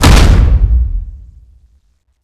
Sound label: step; glasgavelen